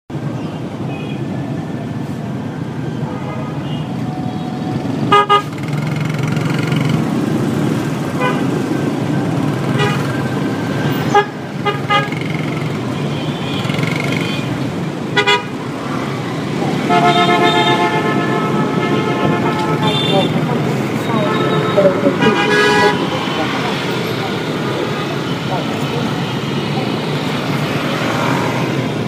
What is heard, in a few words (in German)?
Auf dem Motorrad